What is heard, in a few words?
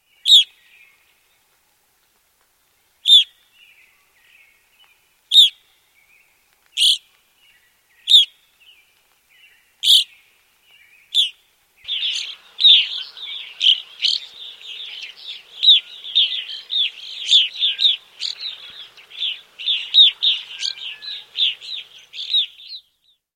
evening grosbeak